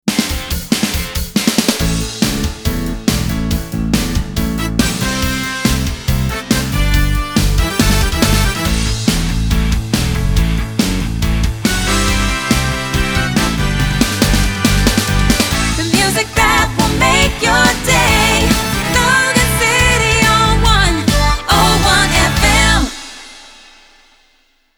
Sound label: Jingle Ramp 140bpm Am